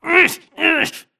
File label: grunt Struggle; Titanfall 2